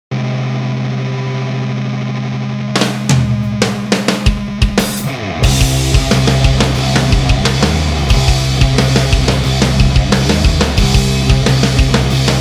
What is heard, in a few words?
Heavy Rock; Drumdrops